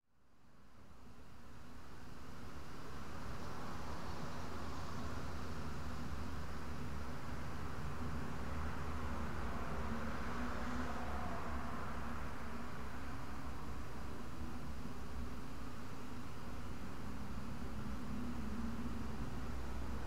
appartment room sound; house ambience

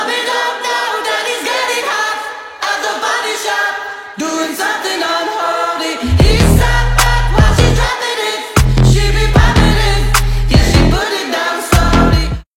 sped up remix

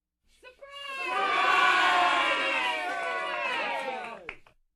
Surprise Party Sound Effect

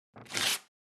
Ripping Paper

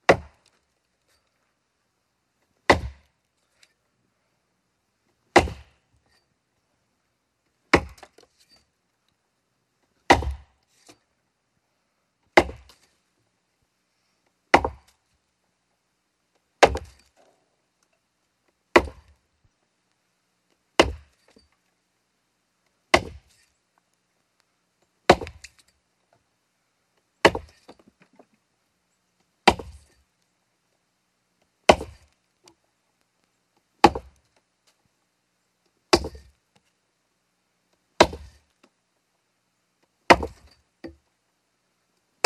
Axe single Hit Sound Effect

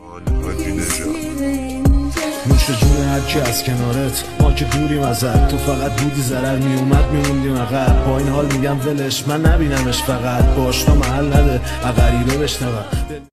Remix, ریمیکس ترکی

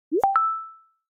Notification sound; Instagram Threads; phone; alarms

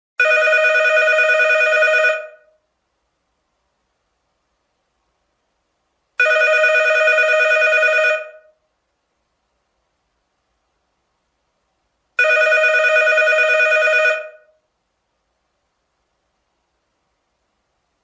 Phone Ring, office

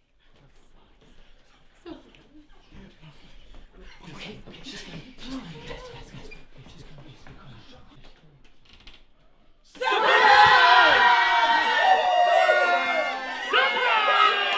Surprise Party Sound Effect

